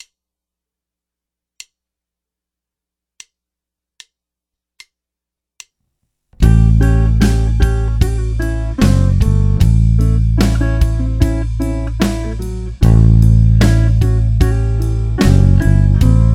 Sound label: 12 Bar Blues, Bass; Simple Blues Bass Lick; Bass Road